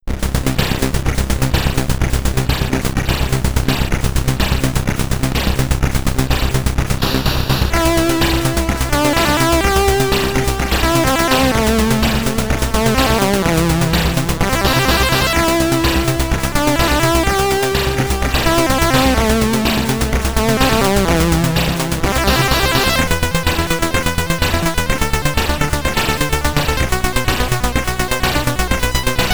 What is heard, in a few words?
SID Stereo; BMX Simulator; Commodore 64